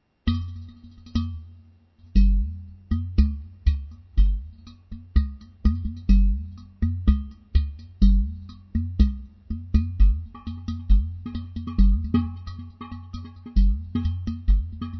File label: udu dumbek